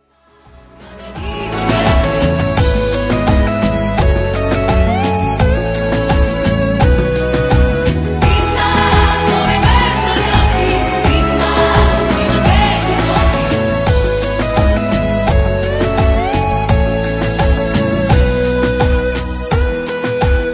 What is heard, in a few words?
Cumbia